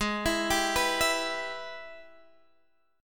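Ab Minor Major 7th Sharp 5th chord, Guitar, Drop D tuning; arpeggiated; x,11,14,12,12,12; JGuitar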